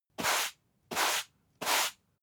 broom sweep sequence

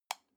light switch off soft

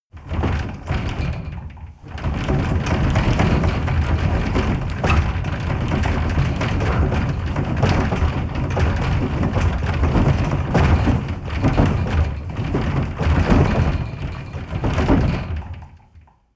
interior rattle hard

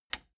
plastic button latch loose release disable

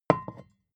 vodka put down tipping hard

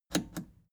plastic button scratch smooth click enable slow

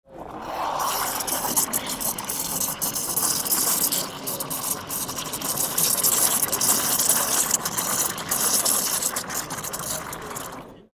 sputtering coffee cream into cup

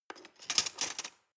cutlery large drawer rattle hard

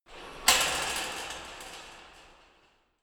metal fence big rattle

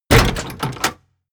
mechanical lever metal medium down

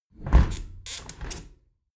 window close heavy sequence

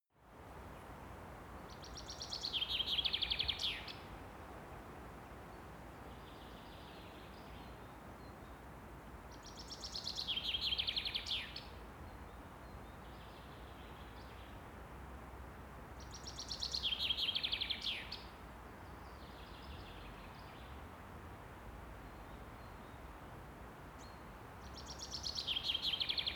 park birds traffic

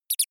mouse squeak

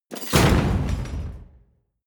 gate slam small

